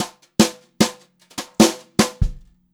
JZ FILL, Brushed Drum Loops, JAZZY; USB Soundscan vol.39; AKAI

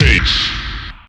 BASS VOX L, Big Beat Samples; AKAI; SPEAKS KIT

